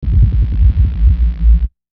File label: Electrical Dark Fuzz Pulse; Blastwave